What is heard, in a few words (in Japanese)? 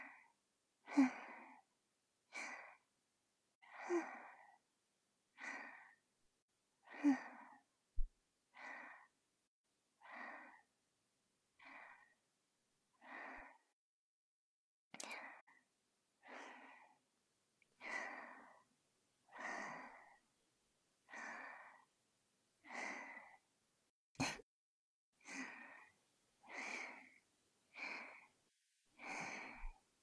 寝息ループ（囁き重視編集）, ASMR